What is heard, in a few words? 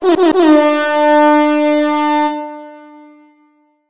reggae horn